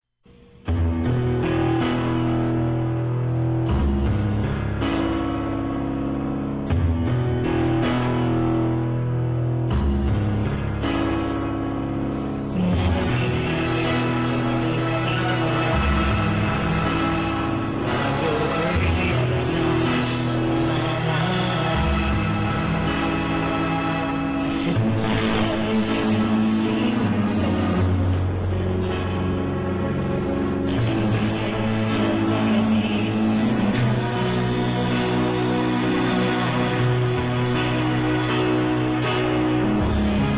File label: piano song; voice sampling